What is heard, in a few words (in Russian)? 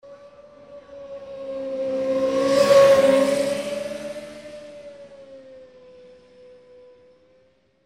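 проезд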